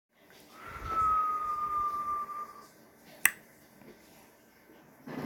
Throw

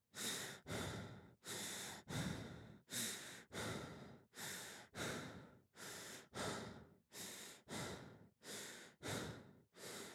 Intense And Very Intimate Breathing After Sport

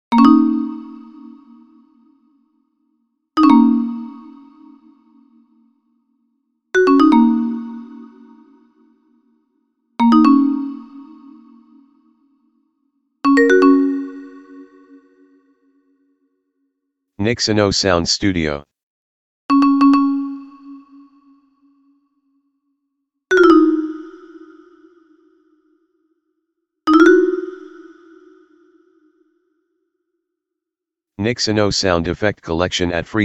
Short Music Jingle And Start And End Music

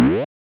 Blop

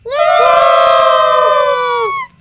Yay

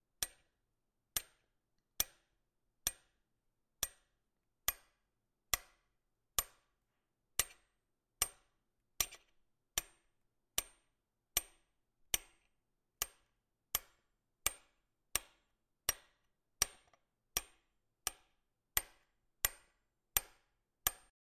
Metal Hitting Metal Fast

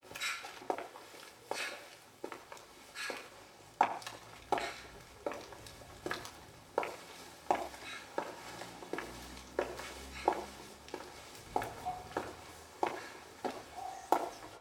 Castle Walk With Raven And Dogs

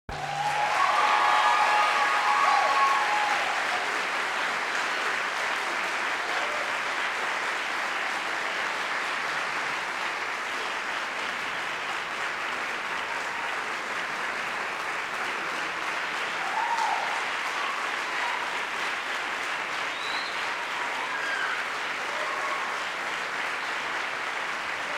Applause

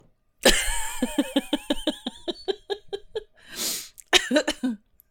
Woman Laughs

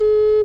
Ocarina Note

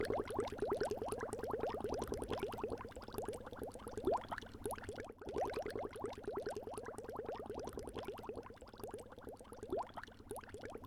Bubbles Zoom

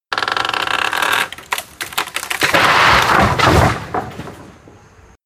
Shed Falling Over

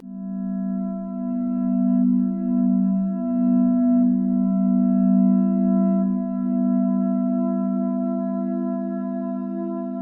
Drone

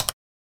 Start Stop Button

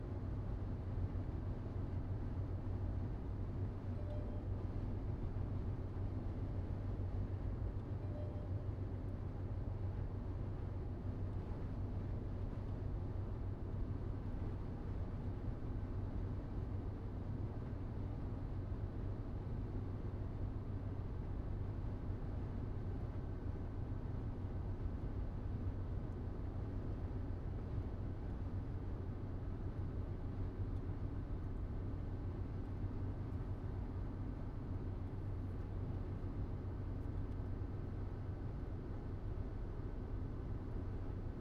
In Side Car Ambiance

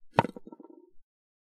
Stone Slab

